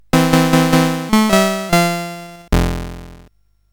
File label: CSG8580R5, GAMES; WitchSwitch